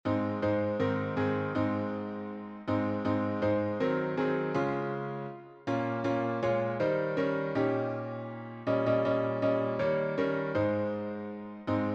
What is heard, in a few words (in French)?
piano; vive le vent; satb arr. james pierepont